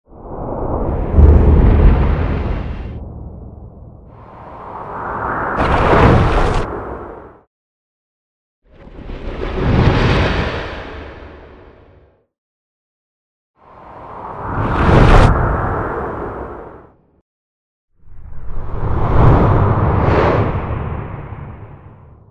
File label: Air Whistle Hit and Fx Effects